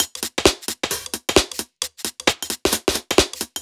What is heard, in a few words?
Beat 132bpm, uk garage